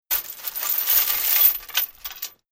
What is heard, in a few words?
Medium Chain Drop To Pile; Metal Sound Effects; LYN104 package of Chain sounds